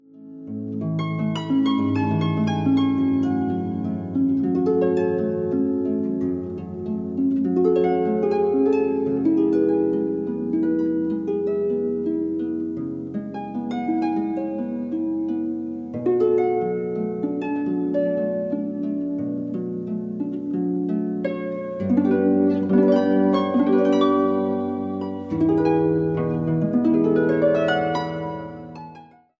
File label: Harp